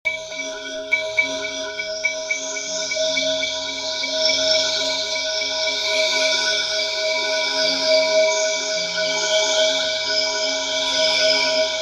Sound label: bells phasing layer